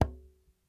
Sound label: One Shot Apentemma Thump, World Percussion; Sony CS